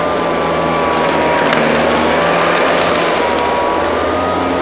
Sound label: lawnmower; evil